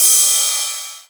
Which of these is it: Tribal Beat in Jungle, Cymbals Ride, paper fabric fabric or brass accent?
Cymbals Ride